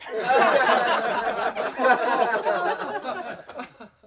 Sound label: boo laugh